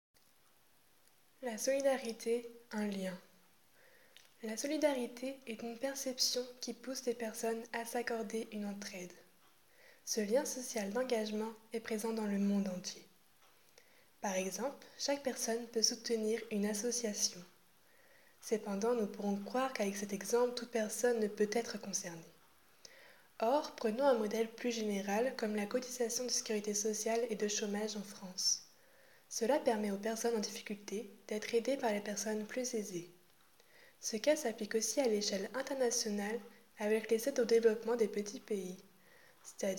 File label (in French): vocal solidarité